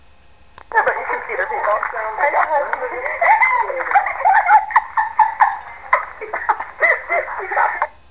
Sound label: tickle